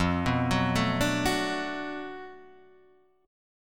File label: B Minor 7th F, chord; Bm7/F; arpeggiated; JGuitar